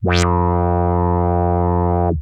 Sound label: Arp D F2, ARP Odyssey, synth samples; musicradar-synth-samples